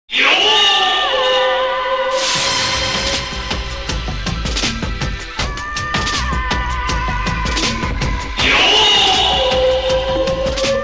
raghuvaran famous bgm baasha and kadhalan bgm mixing